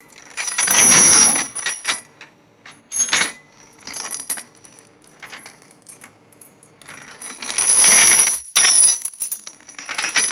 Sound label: heavy metal chain clattering and clinking; chains